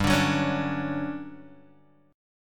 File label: D-Major 7th G; DM7/G Chord {3 5 4 6 3 X} strummed